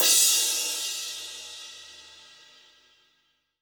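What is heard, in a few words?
cymbal crash; Best Service Gigapack 2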